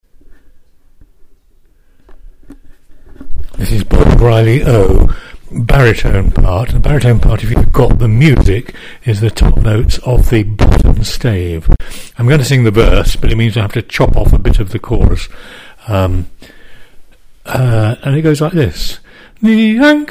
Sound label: Baritone; Bold Reilly O